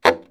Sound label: SAX STAB; Hip Hop; E-MU Formula 4000 Series Vol. 1; Hip Hop Nation; Booty Brass